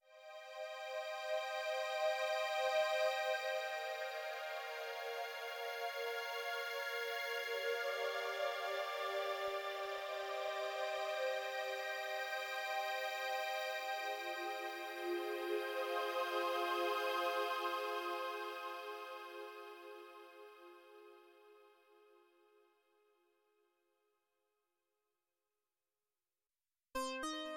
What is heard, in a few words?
Sunrizer synthesizer iPad